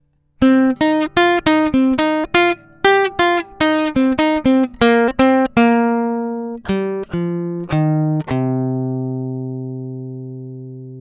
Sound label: guitar solo